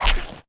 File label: pouch open; player; inventory